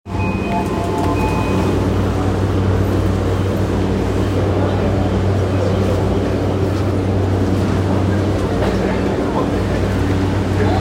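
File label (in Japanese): 山手線 大崎 counterclockwise, train sounds; JR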